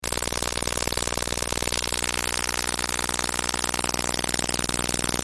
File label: noise